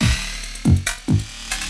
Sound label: LOOP, 140 BPM, Drum & Bass; Ueberschall; Drum & Bass Resonance